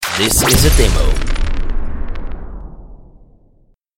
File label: Ba Boom